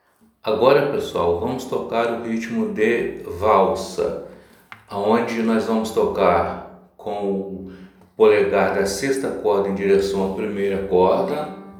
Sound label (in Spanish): Ritmo Valsa